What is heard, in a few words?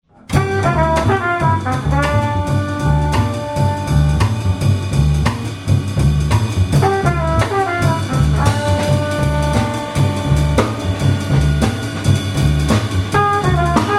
acoustic trio